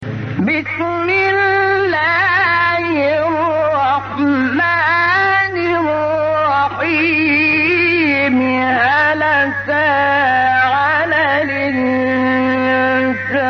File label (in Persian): فراز زیبای ابتدای سوره; دهر; شحات محمد انور